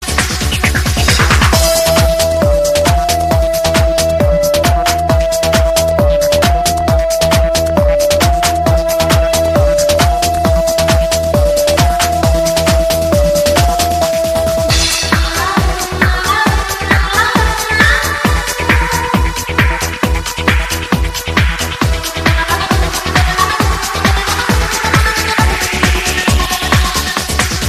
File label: dj party dance musical tone ringtone